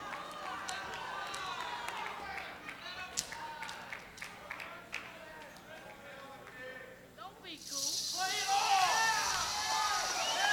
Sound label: The Crowd Requests Their Favorites